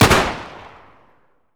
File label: 1911 fire; garrysmod